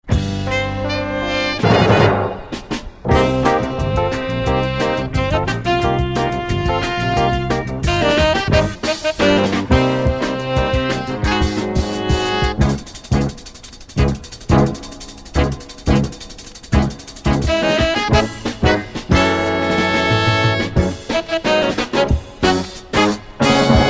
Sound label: theme open